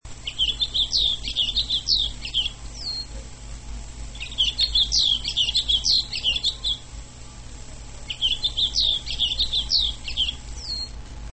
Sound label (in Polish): śnieguła - śpiew